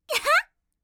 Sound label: recyclebin; Windows; Madobe Ai